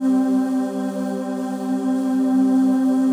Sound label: digi pad, Pads